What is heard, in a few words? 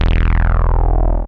Deep Mono Bass